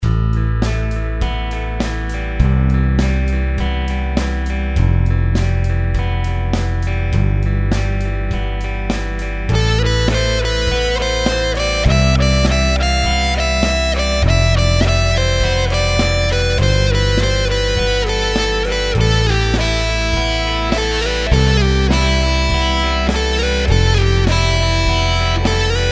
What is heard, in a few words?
me-70 solo